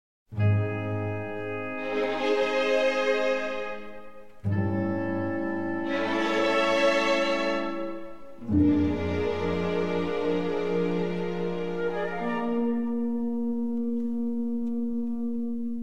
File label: pizzicato